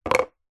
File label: foley tub small thick plastic set down table